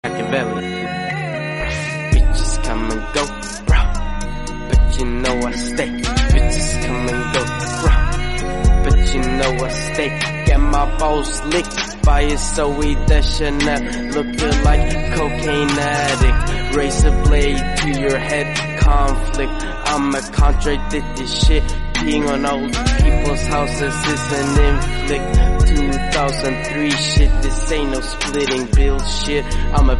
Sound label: cloud rap